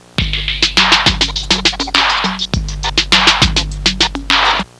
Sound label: rap intr; Sound Library 2000; Wayzata Technology; Music In